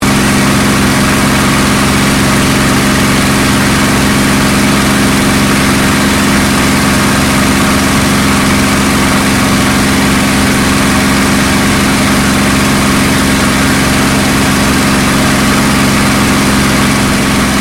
AIRCRAFT CAC CA-18 MUSTANG MK23 FLYING INTERIOR LOOP; CA-18 /P-51 Mustang; Airplanes; Vehicles; Aircraft Collection; Twistedtracks